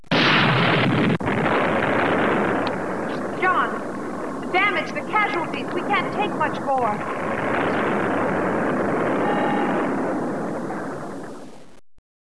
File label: damage